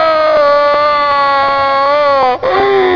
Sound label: GHOST